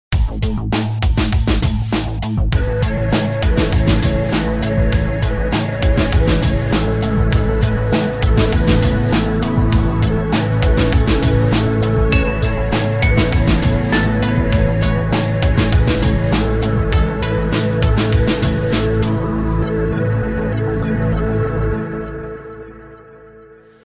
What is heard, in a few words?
relaxing ringtone